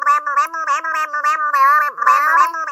Babbling Baby Ringtone

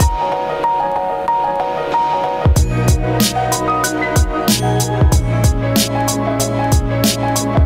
Apple iPhone 11 Plus Sms Ringtone

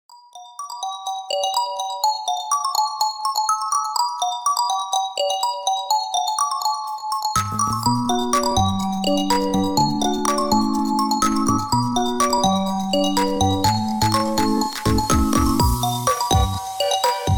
Vivo iQOO Z8 Ringtone

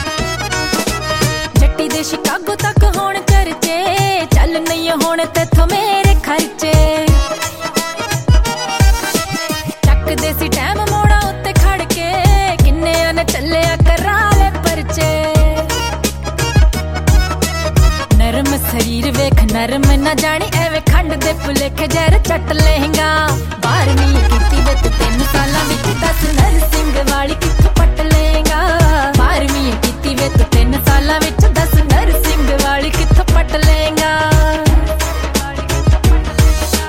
Punjabi Ringtone

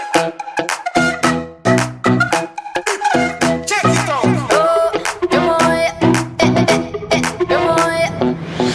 Bansuri Ringtone; Samsung

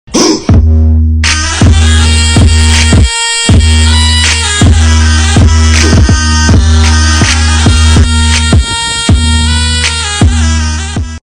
Loud Ringtones for Android

